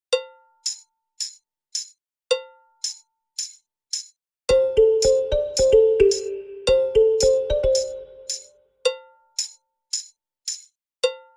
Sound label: balafon